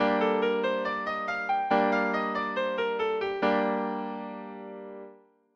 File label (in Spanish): Sol menor, escalas